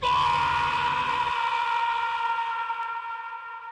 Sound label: short scream